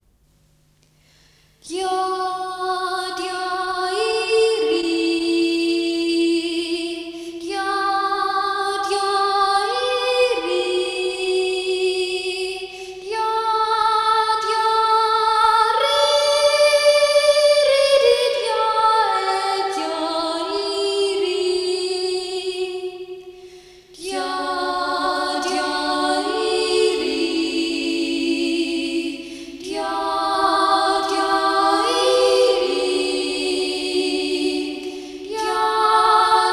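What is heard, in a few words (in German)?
Andachtsjodler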